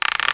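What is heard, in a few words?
rope creak; Thief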